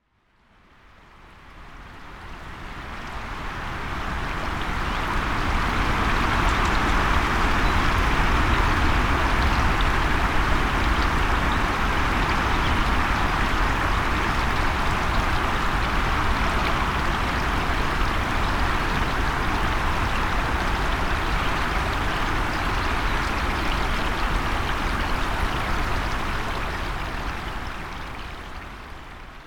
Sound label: scottish stream sample